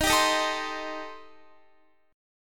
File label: D sharp 6th Flat 5th, D sharp, down, Guitar; strummed; JGuitar